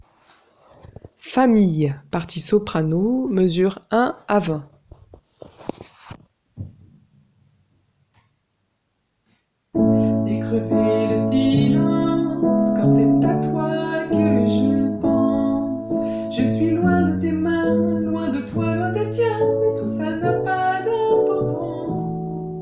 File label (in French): soprano; Famille (mesures 1à 20)